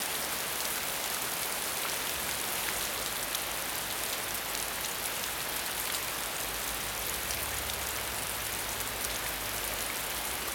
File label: Rain Fall Medium